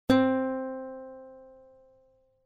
Plucked string fundamental c4; music

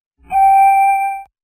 Pan Pipe octave; music

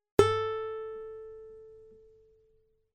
Plucked string major 6th a5; music

